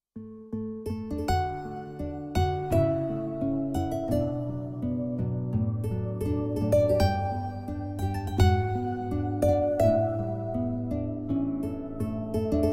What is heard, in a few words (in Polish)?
wersja instrumentalna